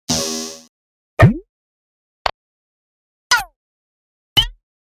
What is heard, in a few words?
5 Sounds Production Elements, Electronic; Miscellaneous Electronic Sound Effects; Andrew Fleming